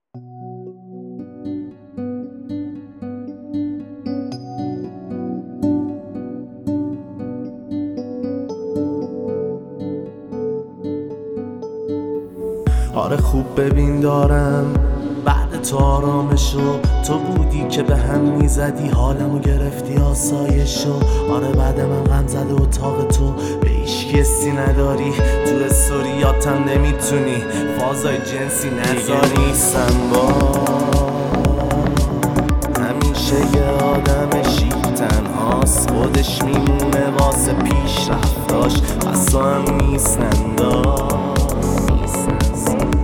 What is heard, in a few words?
Beat